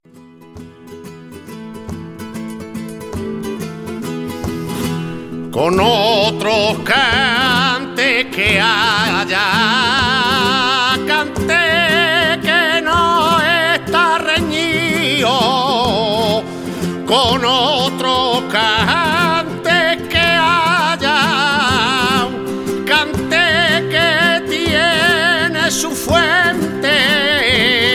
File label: Fandango; de Antonio Abad